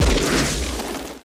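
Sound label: laser gun; Total Control - Action Cutz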